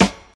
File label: 00s hip hop acoustic snare g sharp key; snare drum samples